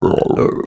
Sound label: frog croak